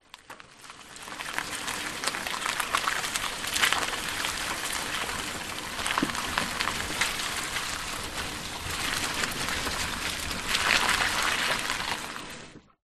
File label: transport car wheels roll on wet ground slightly muddy engine off